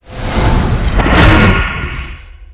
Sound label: ape skill; Seer; GamigoNA